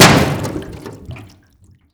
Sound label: metal clang